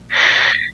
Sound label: Breath